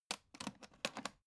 alu case small handle

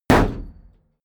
shield sheet impact clank

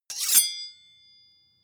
sword blade grind short ring

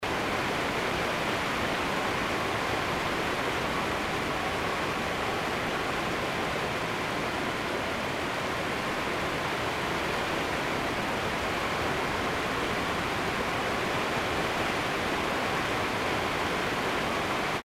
windy rain ambience

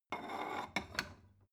stone bowl shelve soft

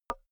wood board knock light

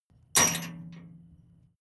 metal chain impact container

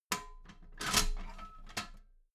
metal ladder small handle unfold soft

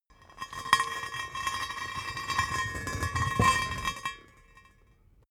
metal heavy hollow rolling concrete

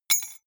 key ring small drop stone

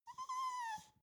dog whimper really sad

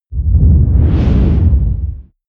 whoosh deep squall heavy short

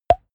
pop simple

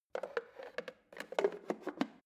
plastic water boiler lid on soft

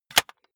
cassette case close hard

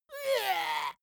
dwarf disgusted

